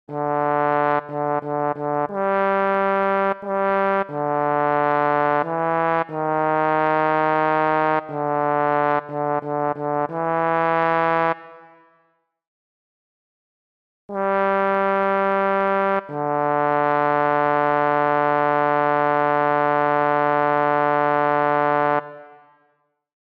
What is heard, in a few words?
Bass, barbershop tags